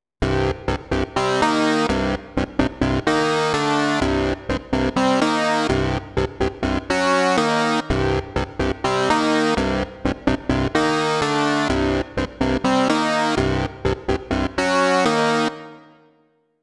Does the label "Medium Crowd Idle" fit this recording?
No